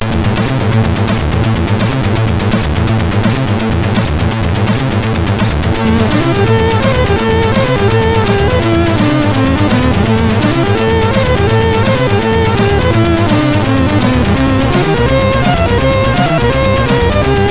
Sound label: sid MOS6581; MOS6581R4